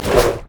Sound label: basic attacks; Ziggs; poolparty